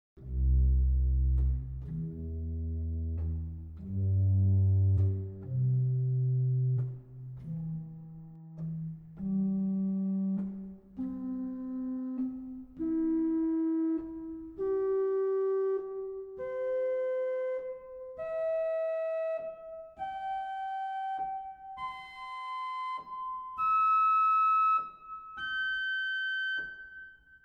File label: Flute; arp; CavColMainz